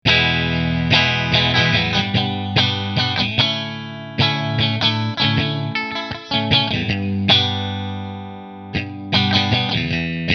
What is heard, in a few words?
Grosh Strat Position 3 Fender